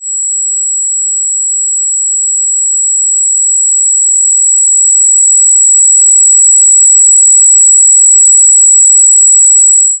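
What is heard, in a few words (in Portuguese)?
fx ativação dos lasers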